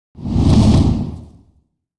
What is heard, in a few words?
Fireball Flame Whoos; fireballs